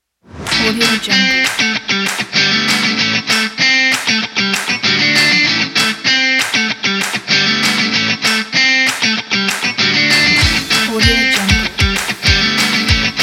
lite rock

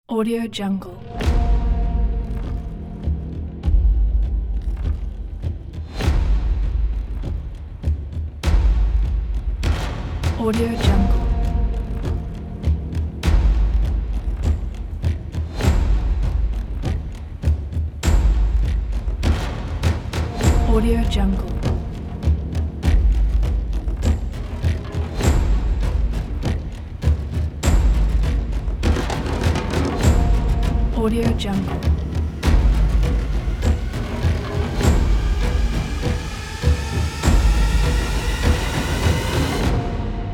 jungle ritual percussion trailer

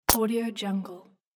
snap

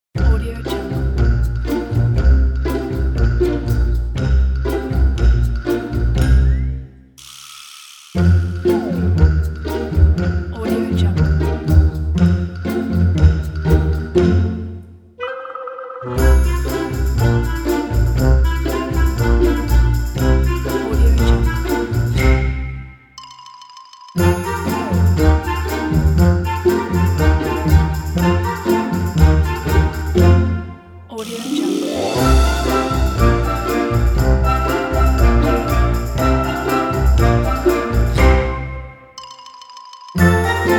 cartoons

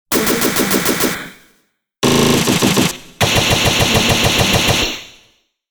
automatic weapons

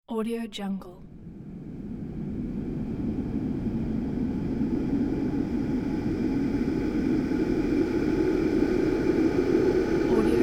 clouds soundscape

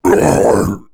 demon pain dying; aaa game character; esmteam

